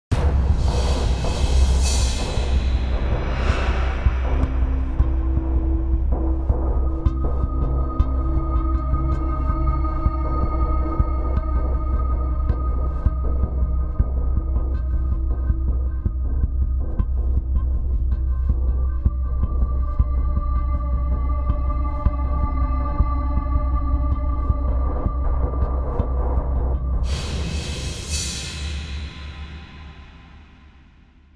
AUTUMN STALKER DRONE

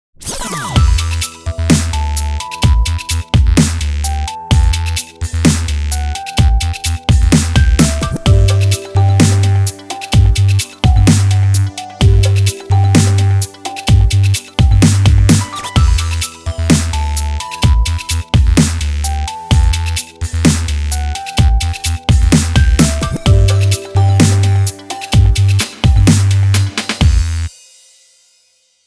BREAKBEAT (HALFTIME DUB MIX)

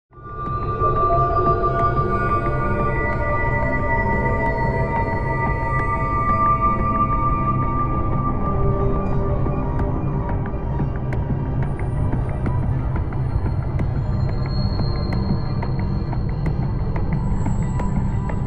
PURE PULSATING DRONE

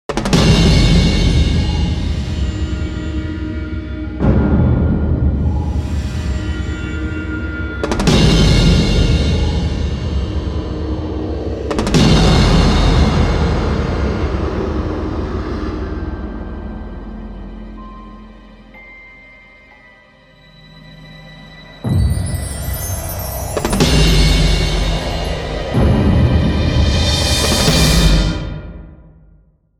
Call To Arms Trailer

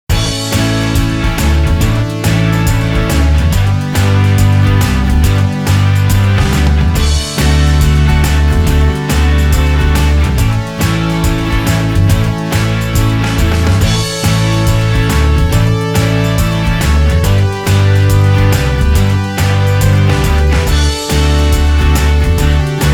Walking Home (Instrumental)

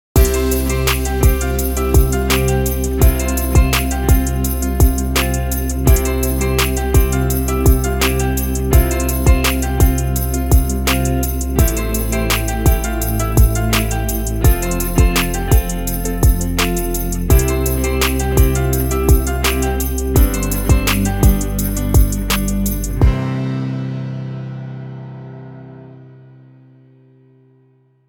A HERO BRINGING HOPE (INSTRUMENTAL)

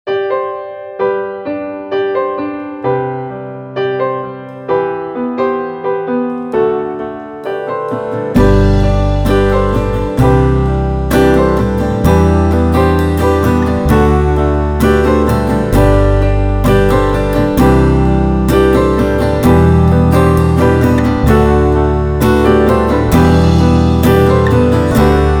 ELEVATED FEELINGS (INSTRUMENTAL)

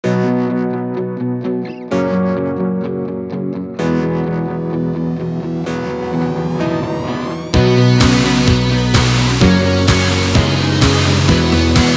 INDIE SUMMERS INSTRUMENTAL

